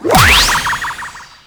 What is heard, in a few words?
Teleport End; Warframe; Captain Vor